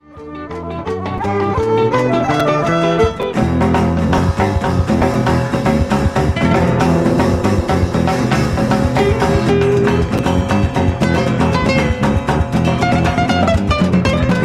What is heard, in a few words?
Spanish Break